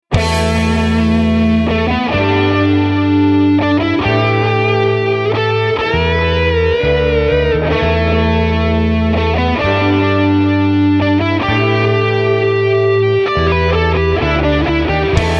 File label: Solo